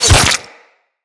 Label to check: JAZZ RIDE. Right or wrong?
Wrong